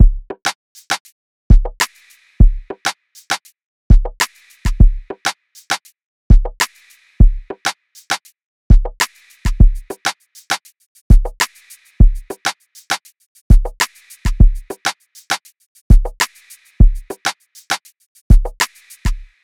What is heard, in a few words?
Dancehall Drum Loop - 100 BPM; Cymatics; Orchid Sample Pack